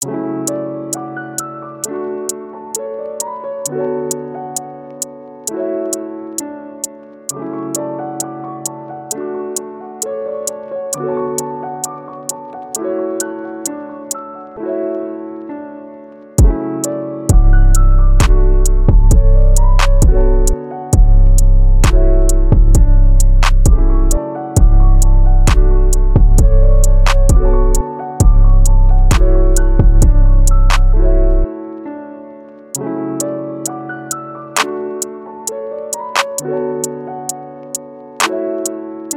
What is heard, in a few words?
LO-FI BEAT